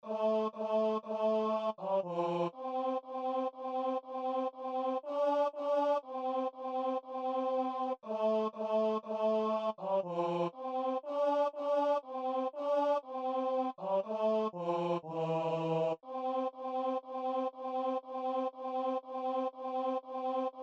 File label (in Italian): tenori; Cantan gli angeli